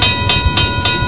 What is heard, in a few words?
BELLS; Kiddie Ride Sound & Control; Down By The Station